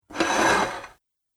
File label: Heavy Pasta Bowl Slides Across Table; Dishware Sound Effects; Household Sound Effect; Andrew Fleming